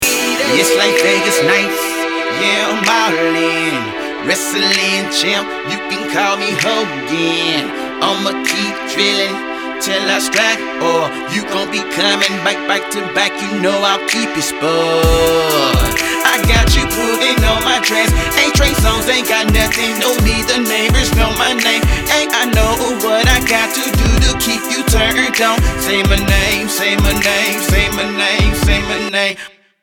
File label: stacked lead no adlibs